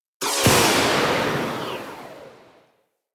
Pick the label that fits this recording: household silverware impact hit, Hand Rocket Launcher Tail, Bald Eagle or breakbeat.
Hand Rocket Launcher Tail